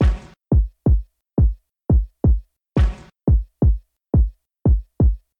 Kick

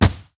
Fishmen Bomb land; we are the dwarves gamerip 2016

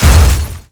Wall Hit

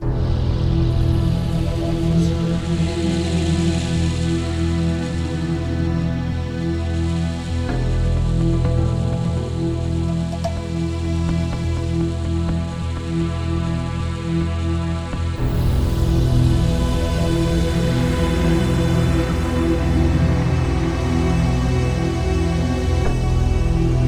Wargate (Loop); beta unreal

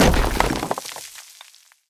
Stone Wall Break

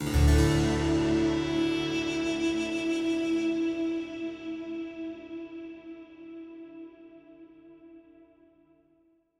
Stingers sting neutral

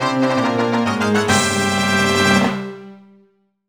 Jingle Clear; Super Mario 64 DS; NDS

